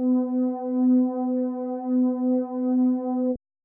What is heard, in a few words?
Pad